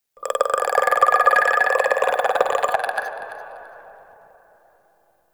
Infested Tendon Chatter, Ambience; Warframe; Corpus Infested

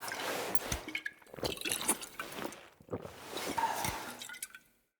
Drink Potion Foley; Rednight; Envoy

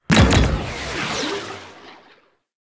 Sentient Fly By; Cinematics; Grineer Eidolon Shotgun

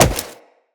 Wall Jump; Footsteps; Wall Run; Characters; Tenno

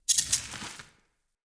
Animal Unearth A, Treasure Hunt

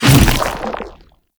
Shadow Mace Whoosh

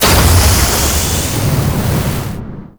Void Dragon Pillar Ground Fire Start; Enemies; Abilities; Warframe; Duviri

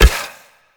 Choir Bow Quick Fire; Warframe

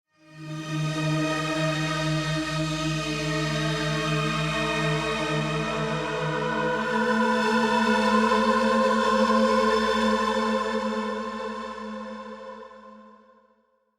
Hangar Ambient Random; Ambient One Offs; Warframe; Railjack

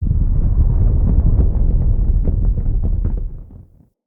Falling Rock Small Rumble B

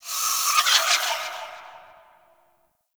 Distant Metal Textures, Asteroid; Warframe; Grineer

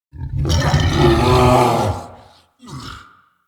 Behind Wall Attack Growl; Vocals; Bromius; Animals

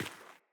Sandals Run Rock, Footsteps

